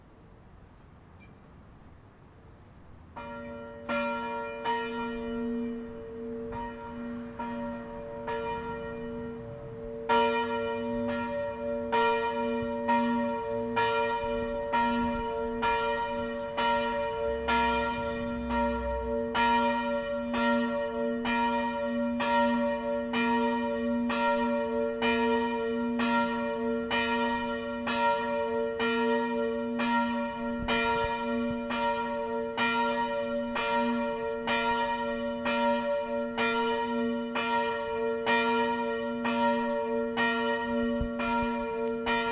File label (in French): cloche; Zell cloche-3; Assemblée générale 2013 de la GCCS à Willisau (LU)